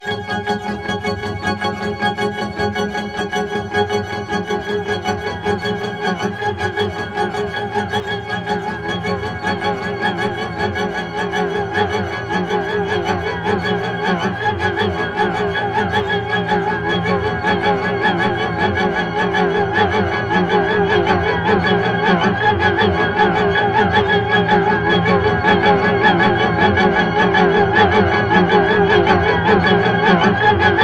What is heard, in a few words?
synth mallets, reactive delay; septimbral